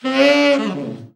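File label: TENOR overblow FALL, Sax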